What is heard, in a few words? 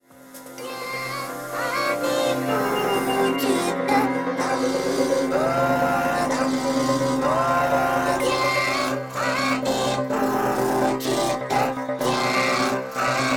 ritual bass; ancientwisdom